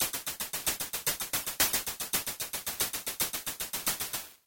Game Over; Streets of Rage 2; Genesis

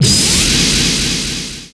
ROCK

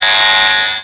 Warning

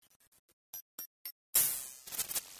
Jingle; Sega Channel Demo Cartridge #4; Genesis

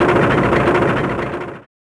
ROCK

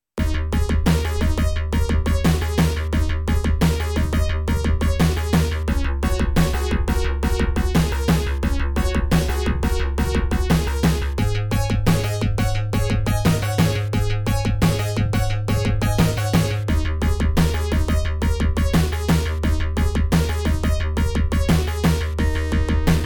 Techno Tune; Genesis; Mick And Mack

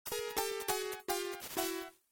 Player Down; Boogerman A Pick and Flick Adventure; Genesis

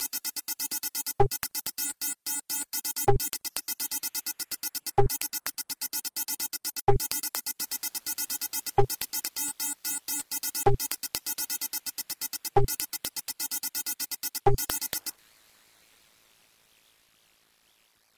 Suspense; Toxic Crusaders; Genesis

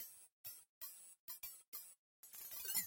Jingle; Greendog The Beached Surfer Dude; Genesis

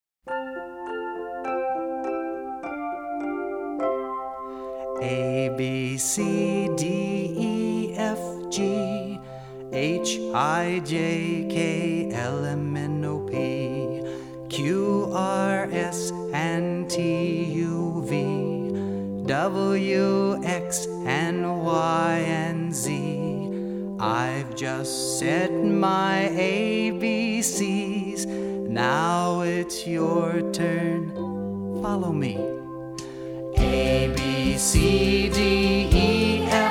Alphabet Song, Children's Favorite Songs; Walt Disney Collection

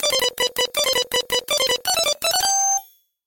Round Clear; Flicky; Genesis

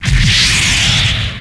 ROCK

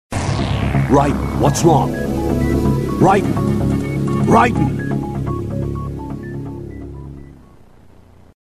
game over; MGS Samples

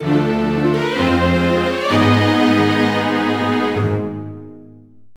A Grave Error, Chiptune; Final Fantasy Tactics A2 - The Sealed Grimoire

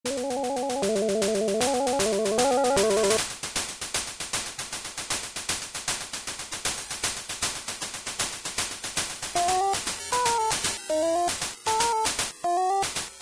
Final Boss; Quack Shot; Genesis

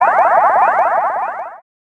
ROCK

